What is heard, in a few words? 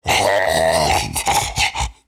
Male Zombie; Zomboid 41.78.16; Project Zomboid